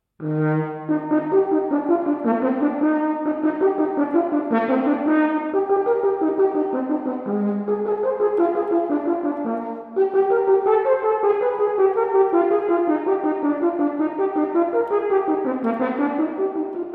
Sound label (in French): Etudes pour cor, Etude 5; Brahms